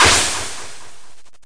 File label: Absorb; battle; Muzyka i dźwięki z gry